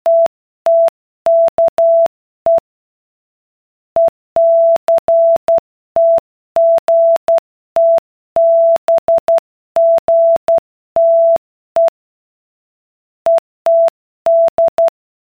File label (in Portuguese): ritmo velocidade